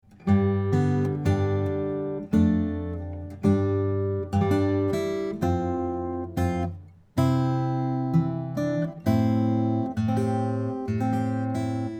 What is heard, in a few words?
Concert model guitar; This guitar is a “high-shouldered” Concert model guitar developed by Australian Master Luthiers; Earle Custom Lutherie; Concert Model 2; Australian Master Luthiers, Gore and Gillete